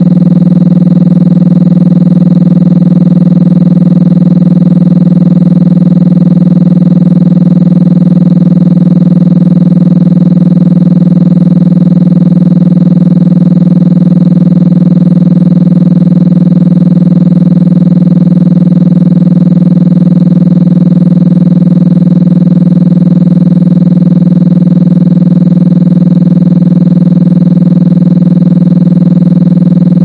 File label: 20hz Sound